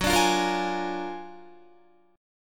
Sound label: Gb Augmented Major 9th Guitar; Gb+M9 strummed; JGuitar